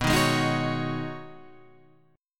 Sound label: B 6th Add 9th B Guitar Standard, chord; strummed